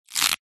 horror hair rip from scalp

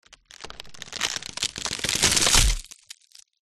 foley wood break splinter stress snap; zapsplat

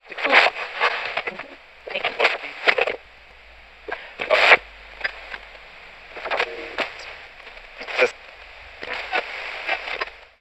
radio tuning between stations static

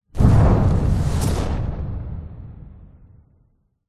explosion med large fire second smaller bang

